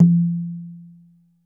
ANALOG KITS; 90 sSampleCDs; Crypton - DrumBase5000; ANALOG KITS2

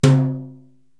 Tom-Brush Hi; Korg TR-Rack

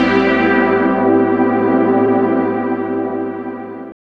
DR. LOOP; X-Static Goldmine 3

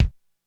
Kick, Korg DSS-1 Drums

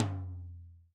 Lo Flat Tm, Alesis SR16

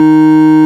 ORG Clr D#3, Farfisa Clarinet; Keyboards of The 60's and 70's

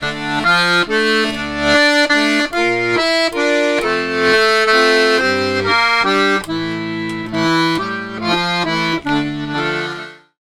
Accordions; USB Soundscan vol.40; AKAI

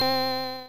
synth, amiga med

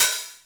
open hihats, techno; Techno ID

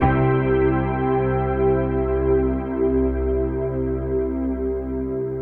Big C Chord, Drum & Bass

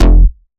Bass F, Bass Synth

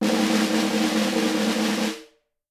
SM SN, Percussion; Miroslav Vitous - Percussion & Harp

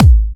Electro Kicks

